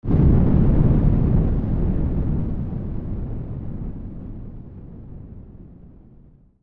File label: burst